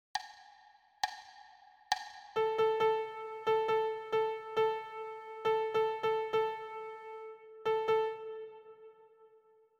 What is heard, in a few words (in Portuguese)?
Ditado ritmico 34